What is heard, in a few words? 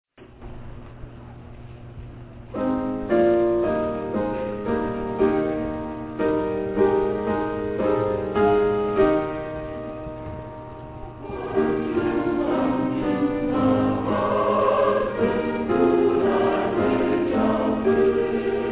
choir